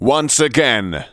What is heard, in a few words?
vox; Ueberschall; Techno Trance Essentials